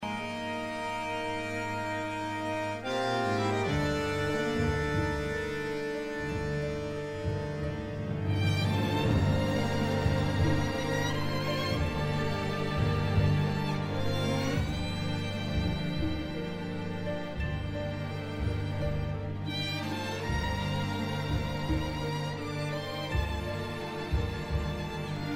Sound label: Bandoneon Concerto Aconcagua Moderato